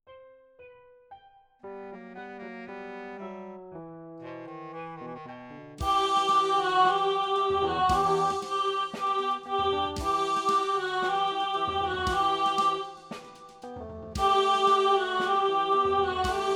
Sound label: Soprano Alto, rehearsal